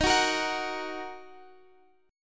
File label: Eb Minor, Guitar Drop D